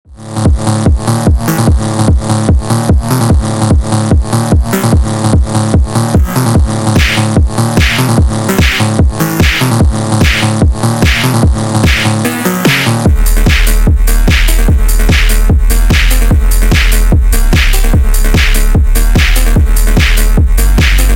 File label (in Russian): Bass sped Up, ЖЕСТКИЙ ФОНК